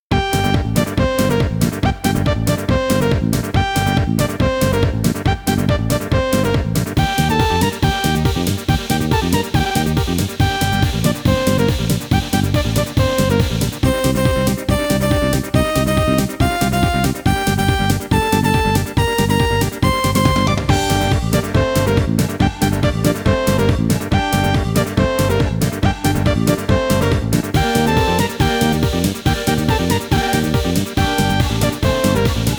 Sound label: fight; イメージ：疾走 戦い カテゴリ：疾走・アップテンポ